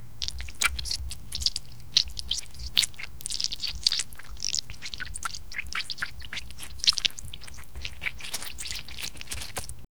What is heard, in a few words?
a squishing squelching sound, slime